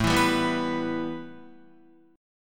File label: A 7th Suspended 2nd A, Guitar; A7sus2 strummed